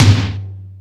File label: Lazer Drums, drum machine